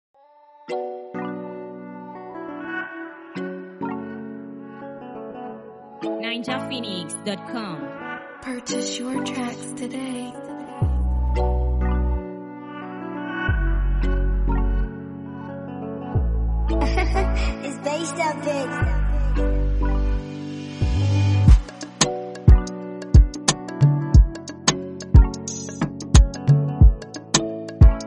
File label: Afrobeat Instrumental